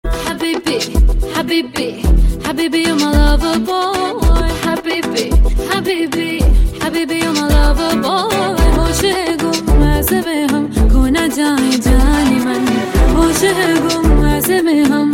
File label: arabic ringtone